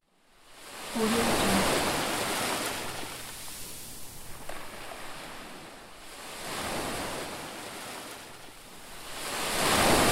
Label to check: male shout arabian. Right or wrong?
Wrong